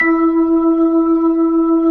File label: B-3 E 5, Jazz; Keyboards of The 60's and 70's